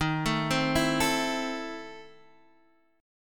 E Major 7th Suspended 4th Sharp 5th Eb

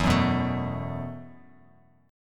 E Suspended 2nd Suspended 4th E, Bass Standard; strummed; JGuitar

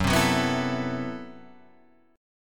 A Minor 6th Add 9th F sharp, Chord

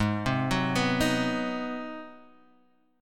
G sharp 7th Sharp 9th

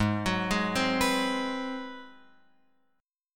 Ab 7th Sharp 9th, Guitar, Open F; x,3,6,3,3,6; arpeggiated

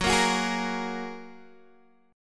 F sharp Major Add 9th F sharp, Guitar Open D; chordshape; down

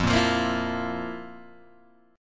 F Major 7th Suspended 4th, Guitar, Open G

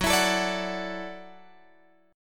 F sharp Minor 7th Sharp 5th, Guitar Drop D; chordshape; down

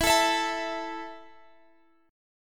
A 5th E, guitar chord

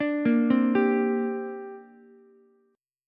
D 7th Suspended 4th Sharp 5th down Ukulele; D7sus4#5 arpeggiated

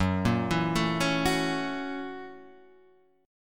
Gb 7th Sharp 9th Gb Guitar Open G

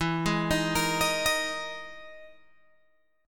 E-Major Sharp 11th E, Guitar Open G

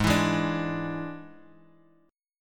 D Suspended 2nd Flat 5th G sharp, guitar

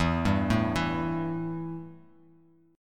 E Minor Double Flat 5th E, Bass Standard

